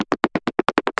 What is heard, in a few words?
steps